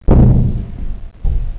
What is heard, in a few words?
player swim